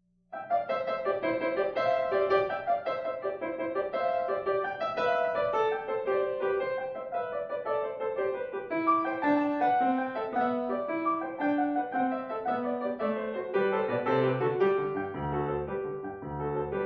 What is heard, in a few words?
Scarlatti Sonata in C Major; D. Scarlatti; K. 159